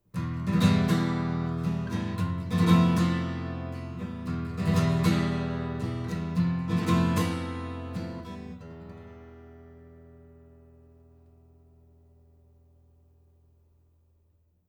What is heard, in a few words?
Apex 205 Stock AcousticHard; Stock mic – Acoustic guitar strummed; ribbon mic